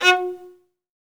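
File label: SOLO VIOLIN, Synclavier Strings; ILIO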